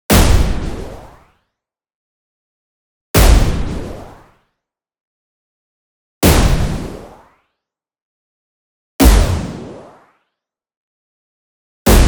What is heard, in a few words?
IMPACT WHITE NOISE Power Explosion; boom library; Anime Essentials